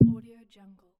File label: underwater glass tap